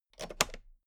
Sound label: toolbox latch close regular